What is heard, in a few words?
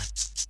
P, PERCUSSION